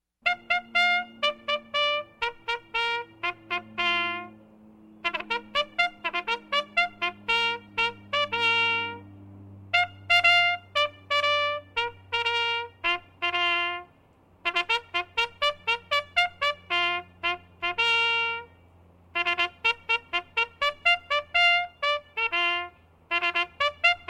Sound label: Bugle Call Outdoors - Review